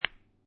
Billiard Pool Snooker Two Pool Balls Clacking Together